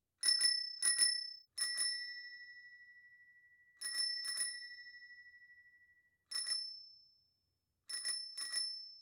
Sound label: Bike Bells Several; Fairlight Sound Lib